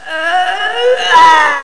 bocejo; 7invasores

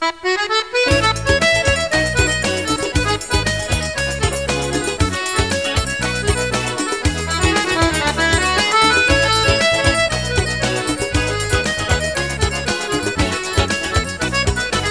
Forro; GEOGRAFIA

